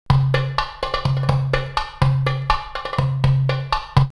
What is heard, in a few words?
Mecca 124, drumloops World Ethnic; X-Static Goldmine